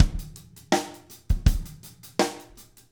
16TH QUICKY, Drumscores

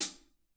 hat, drum

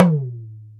Talking Drum Down; Multisamples; Digital Sound Factory Planet Earth

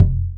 SuBasso Drum; Multisamples; Digital Sound Factory Planet Earth

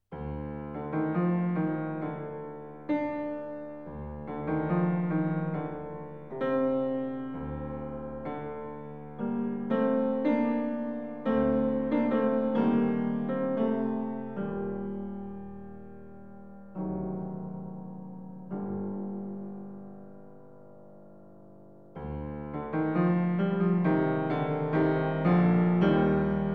Hymn; Fourth Way; Piano

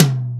Hi Dry Tom; Proteus 2000; Multisamples; Digital Sound Factory Proteus 2000

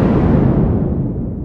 SYNTH EXPLOD; SOUND EFFECT; EXPLOSIONS; AKAI S6000 CD-ROM - Volume 1

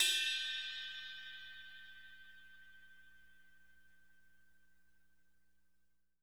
CRASH BELL

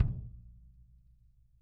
KICK, Drum Kits

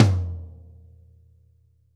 tom, drum; 90s sample CD

